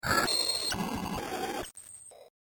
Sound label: Scrambled Radio Signal Tuning Static; Scifi Technology; Noise; Electronics; BBFX